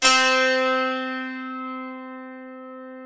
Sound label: C4, DULCIMER; SoundBytes; Early Patches